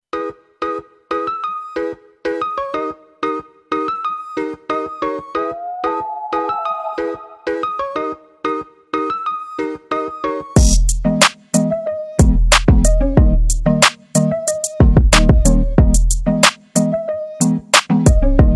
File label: INSTRUMENTAL RINGTONE